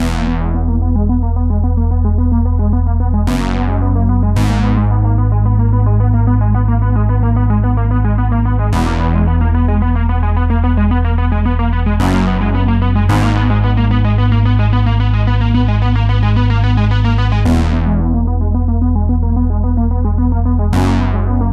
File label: Synth Loop 110 BPM G# Min, Future Bass, Chord Loops